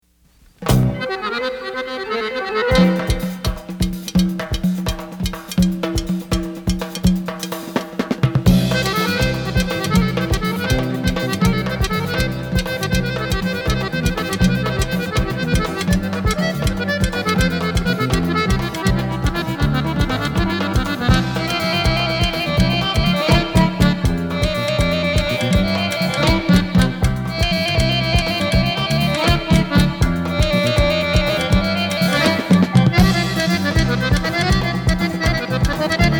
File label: Instrumental